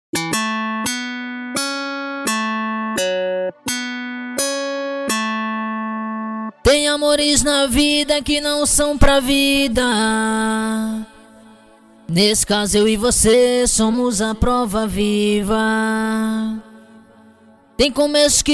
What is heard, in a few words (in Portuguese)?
acapella 170 bpm